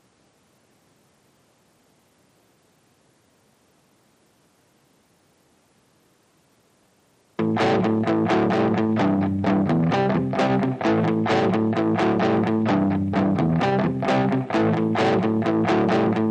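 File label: Jakita, electric guitar rhythm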